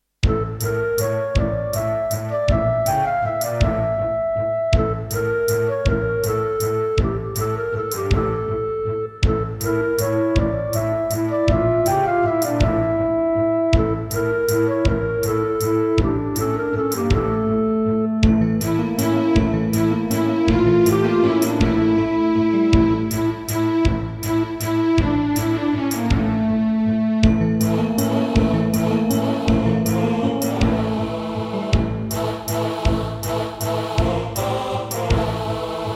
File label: Korg Audio Gallery AG-10